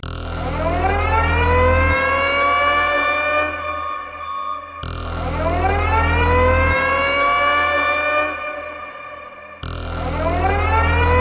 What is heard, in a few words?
Enigma alert